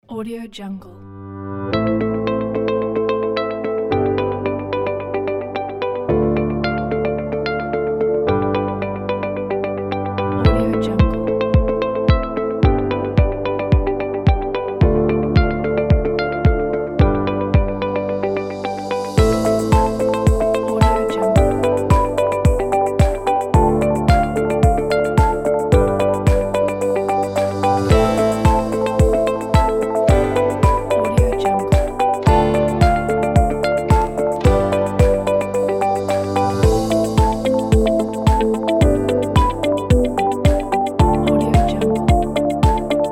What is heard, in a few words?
corporate minimal